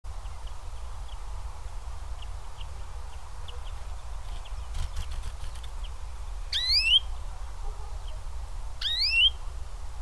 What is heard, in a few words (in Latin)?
Chloris chloris; SETE